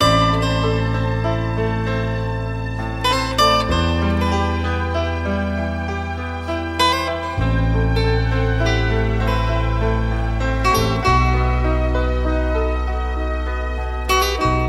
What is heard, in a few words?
guitar eternal sorrow, Loops, Sad